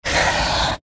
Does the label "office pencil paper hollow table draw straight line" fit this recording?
Yes